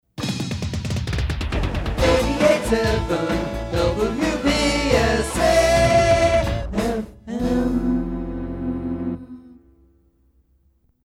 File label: JINGLE Cluster Slow